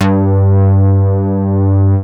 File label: G2, BASS; Q Up Arts; Dream Experience; BASS BANK 13